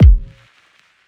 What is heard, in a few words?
kick; eddyflux; crate bd; dough-samples